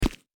Plastic, Footstep

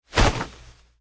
Cloth Move; Gameplay

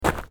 Pebble, Footstep

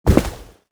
Fabric, Footstep

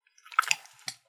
Wet Rubber Slight; Sex Sounds; ero sfx

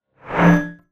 GUI Swipe

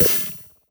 GUI Select

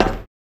Corroded Metal, Footstep

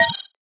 GUI Scroll Sound; ROBLOX GAME ASSETS

